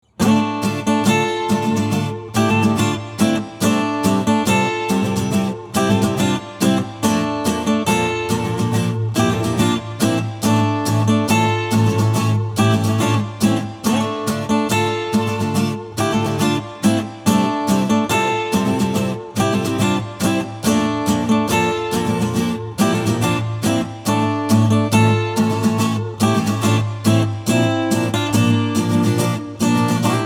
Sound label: Acoustic